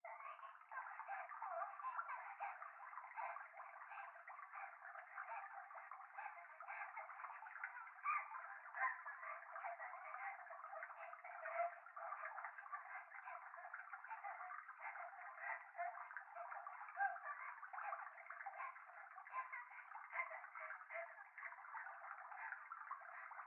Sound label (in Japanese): 風呂 可愛い喘ぎ 川のせせらぎ, ASMR